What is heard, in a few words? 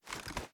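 reload fast xmag start, shotgun; gmod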